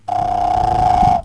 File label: ggrr, frogs